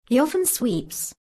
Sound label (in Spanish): sweep, frecuencia